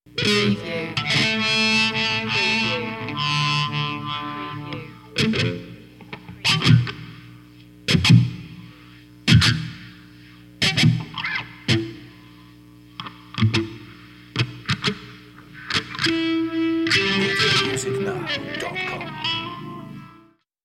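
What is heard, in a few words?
Dist Guitar Noise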